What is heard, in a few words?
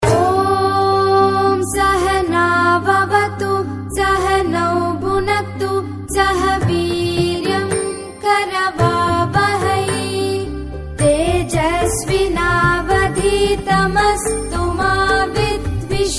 mantra for shanti